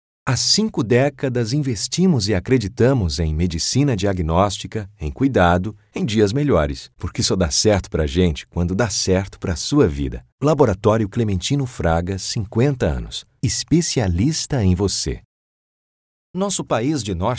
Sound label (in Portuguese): INSTITUCIONAL